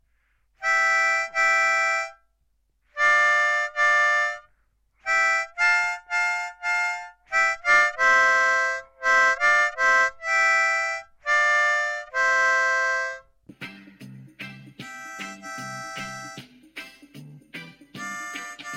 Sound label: 10 hole harmonica